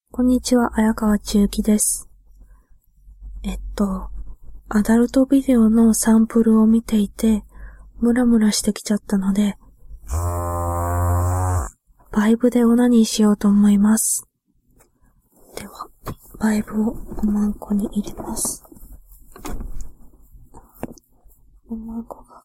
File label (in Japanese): ノイズがわりと入ってる音声 バイブオナニー; オナバカ; こえ部ガチオナ